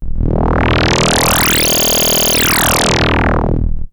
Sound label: Moog G1; Hollywood Edge; Giorgio Moroder Rare Synthesizer Collection; MLTI.MOOG